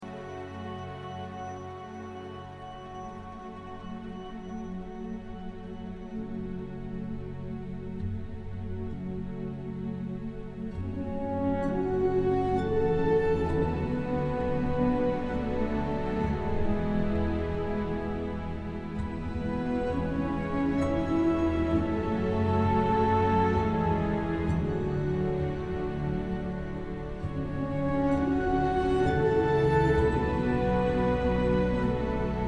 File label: instrumental; Gladiator; آهنگ های فیلم گلادیاتور